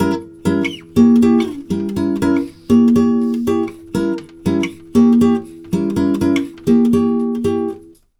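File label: 120 guitar G diminished, brazil bossa; USB Soundscan; AKAI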